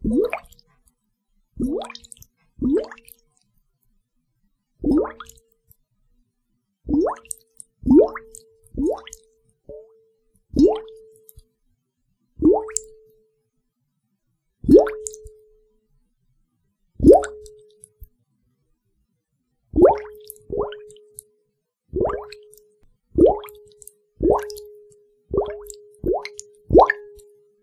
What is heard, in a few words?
Bubble Chain; Bubbles